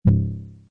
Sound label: Subtle, Scifi, futuristic deep synthetic sound; Button clicked or science machine activated/deactivated; Science Fiction Sound Effects; Lynne Music